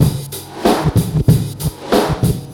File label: back 94bpm, Hip-Hop Street Beats; Construction Kit; Street Beats by Poogie Bell; Nobala